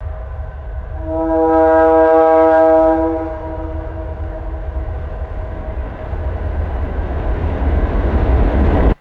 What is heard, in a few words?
distant train horn blaring; trains